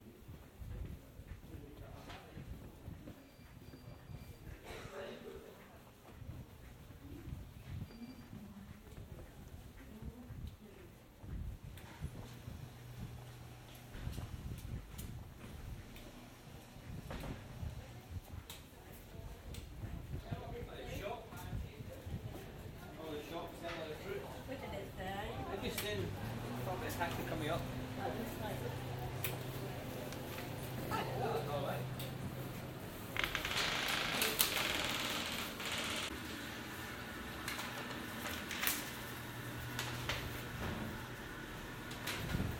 check up at hospital to the pharmacy and hot tea soundscape binaural; st johns hospital